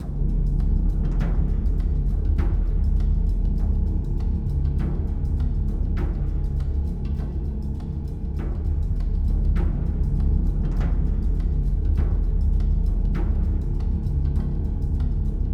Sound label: Space Bongo